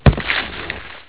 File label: splat; savagewheels